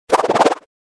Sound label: move fluttered small